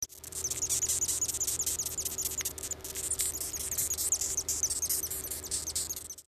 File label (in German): erregungslaute acherontia atropos; thomas ziebarth